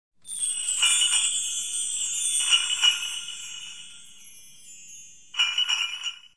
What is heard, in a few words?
sled idle